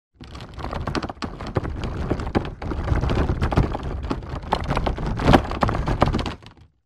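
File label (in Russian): дрожит мебель в доме; Звук землетрясения в доме; когда трясется мебель, падает посуда с полок, звуки скрипа, гул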